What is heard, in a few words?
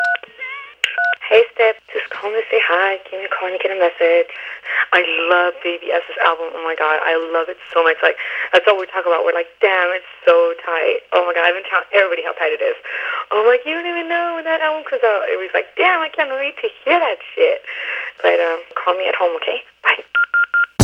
phone call interlude